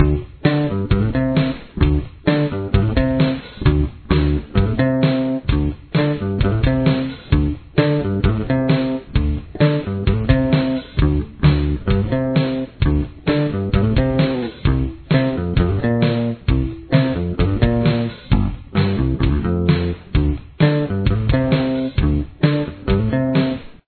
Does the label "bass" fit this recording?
Yes